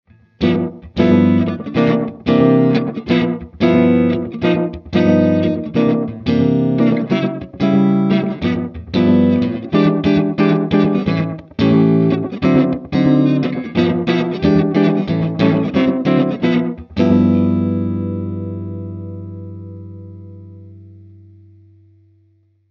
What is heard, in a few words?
X-TIME SLAPBACK; xgear